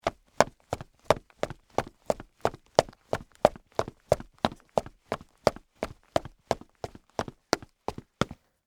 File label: Combat Boots Run, Footsteps, Combat, Boots, Run, Wood, Surface; BlastWave